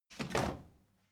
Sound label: step crowd march quiet stop; schritte